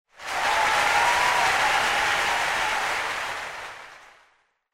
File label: Cheer Crowd, Low Short